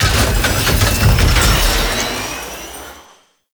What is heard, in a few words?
Dropship Mount Emplacement; Veilbreaker; Devourer Kahl